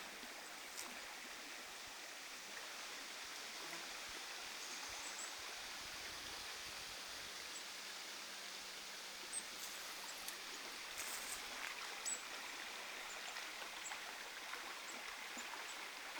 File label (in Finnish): vertailuksi normaalia sinitiaisten aantelya